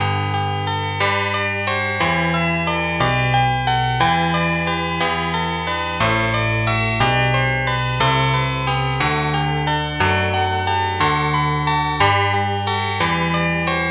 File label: jazz guitar